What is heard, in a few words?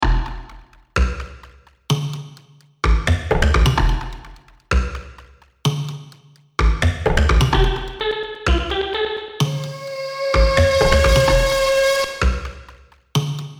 beatart